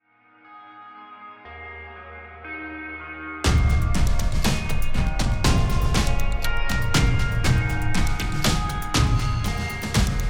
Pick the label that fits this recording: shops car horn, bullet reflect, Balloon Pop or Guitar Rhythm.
Guitar Rhythm